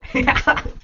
thermos giggle

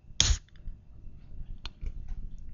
open can, tuba

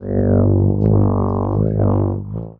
tuba didge

